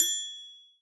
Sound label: Dinr Bell, Alesis D4